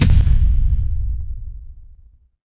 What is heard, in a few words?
transition impact hit